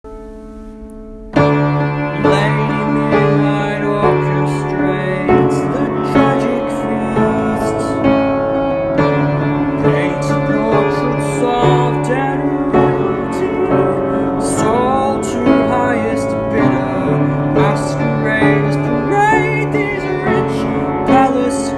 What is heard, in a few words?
piano chorus